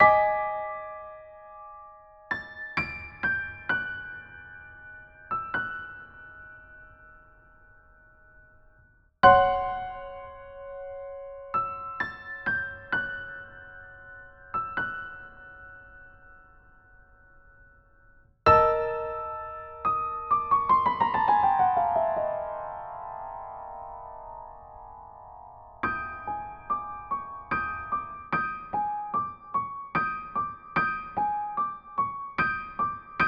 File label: foreboding